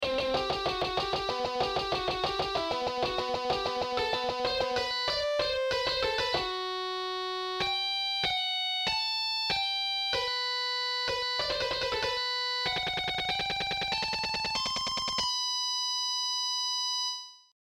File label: solo